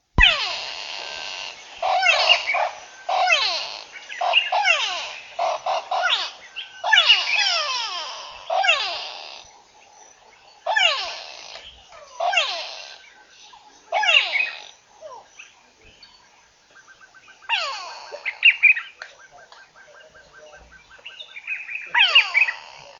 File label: comical bird in savannah; soundscapes